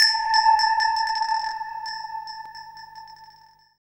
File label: atmo, FX; Ueberschall; Techno Trance Essentials